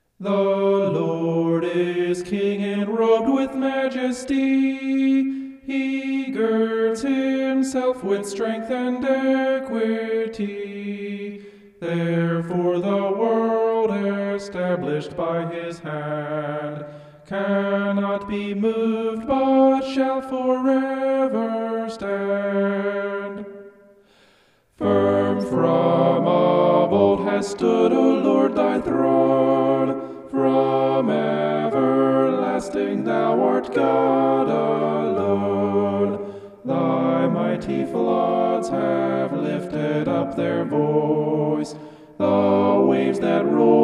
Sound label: vocal homoph; psalm 93